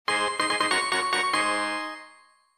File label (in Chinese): 得到道具